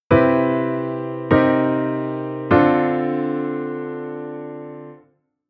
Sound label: Chord Im7